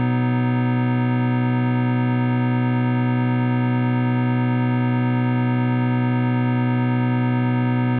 b chord, Omnichord

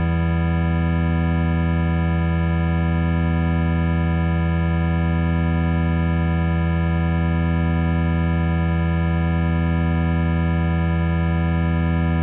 em chord, Omnichord; OmnichordGodot

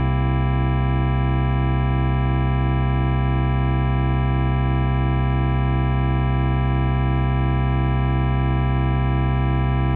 c7 chord, Omnichord; OmnichordGodot

